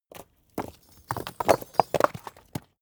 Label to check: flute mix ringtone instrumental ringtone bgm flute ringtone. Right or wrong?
Wrong